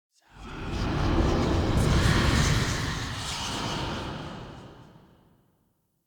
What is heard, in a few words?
Ending Whoosh; Cub Select Ending; Cinematics; Orengall; Orengall Quest